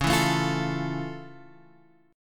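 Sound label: F Augmented Major 7th D flat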